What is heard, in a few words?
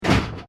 Train Series E731 Express Internal Door Close, Japanese Trains; Aomori; Vehicles